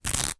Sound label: foley cloth denim rip